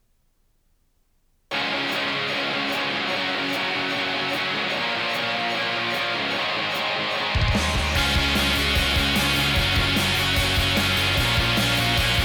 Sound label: rock gold-plated tape; gxz7100ev